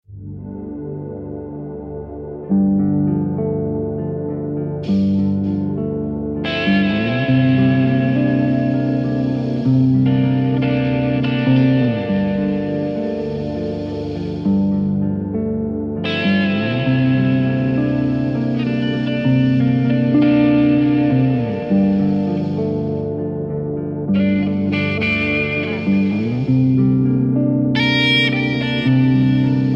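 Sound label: Gm 100 guitar impro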